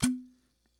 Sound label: Pop Dishes Soaking